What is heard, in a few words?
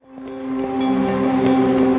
Fluid Textural Pad; Instruments; Ethereal Pads; Ableton; Mood Reel